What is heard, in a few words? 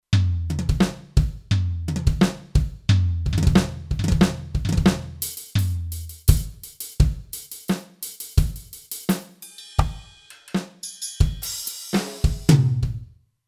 Kurzweil K2500X Layered Snare Kit